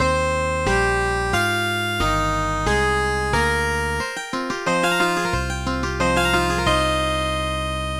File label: tone